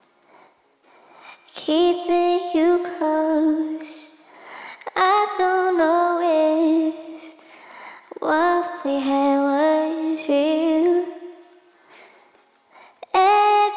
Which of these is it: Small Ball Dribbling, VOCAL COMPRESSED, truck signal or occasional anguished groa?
VOCAL COMPRESSED